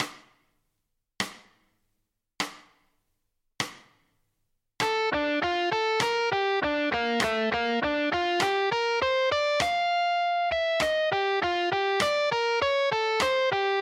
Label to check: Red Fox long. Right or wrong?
Wrong